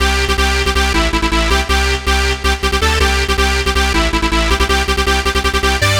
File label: Dance Synths, Hardcore Loops, Synth Loops